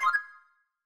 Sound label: confirm, tones; inteface; inteface sfx pack 1